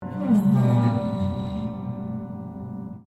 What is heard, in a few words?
Piano Upright Broken String Slide Down Rubber; Upright piano without keyboard; Musical; Pianos